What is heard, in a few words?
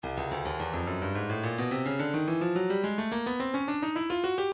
breakbeat 107 bpm; zolar plexus b; Stylus Breakbeat Midi Files